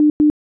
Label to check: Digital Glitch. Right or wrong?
Wrong